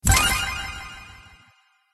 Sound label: pubg weapon pickup